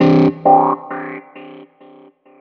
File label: Over, keys